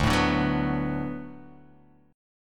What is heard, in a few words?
D 6th D down, chord